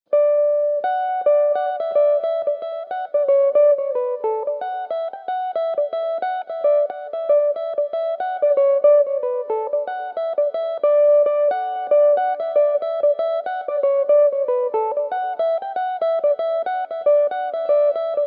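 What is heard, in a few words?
irish filly D major, irish mandolin; Belmuse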